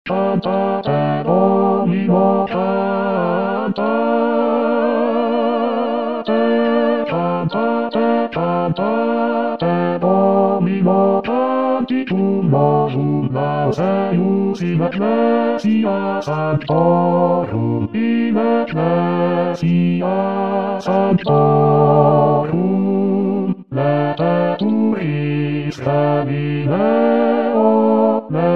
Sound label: Bas cantate domino; Pitoni; Giuseppe Ottavio